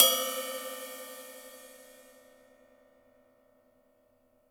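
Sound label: cymbal, Real Drum Kit, swing kit; Best Service ProSamples vol.43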